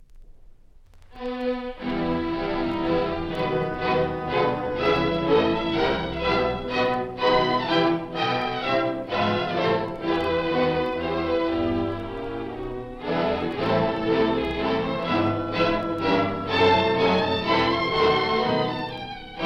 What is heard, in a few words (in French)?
Chopin Concerto 1 piano mvt 1 extrait